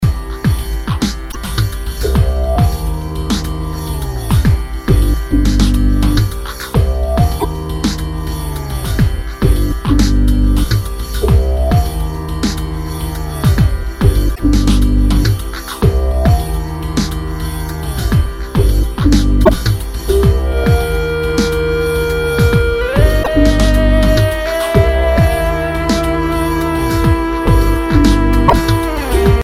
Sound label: dreams grooves